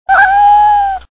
kedi miyav; hayvanlar

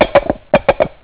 at, hayvanlar; efekt

